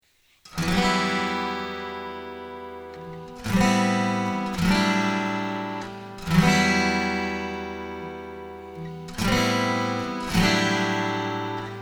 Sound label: TAG1E, acoustic guitar; Yamaha